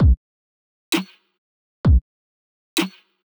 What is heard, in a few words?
drum fl